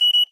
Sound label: suica card